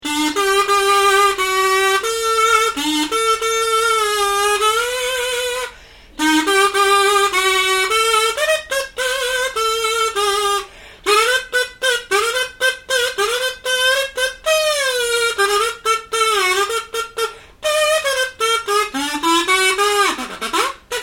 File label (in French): mirliton